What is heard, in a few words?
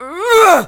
grunt